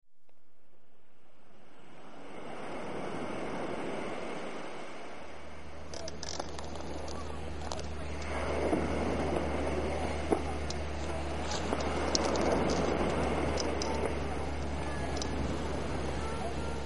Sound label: Waves thundering; beach